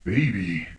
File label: BABY; AstroRock™ Demo